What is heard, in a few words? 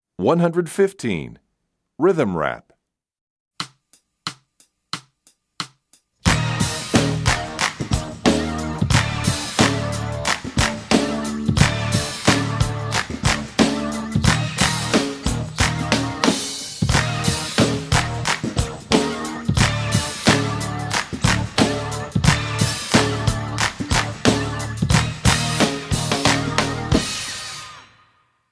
Rhythm Rap, Percussion; EE2000 Book1